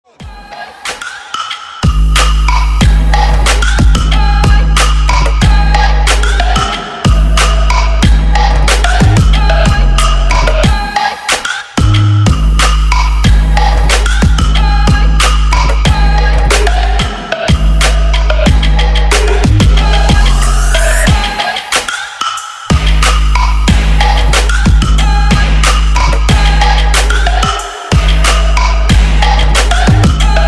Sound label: trap